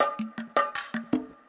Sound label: PERCLOOP ETHNO 160